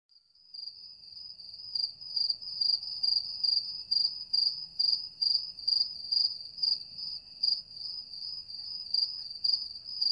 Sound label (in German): Grille Zirpen